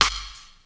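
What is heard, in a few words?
verby snare drum sound a key, tuned to the A note; snare-drum-samples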